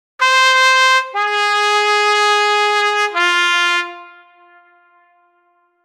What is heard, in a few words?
trumpet fanfare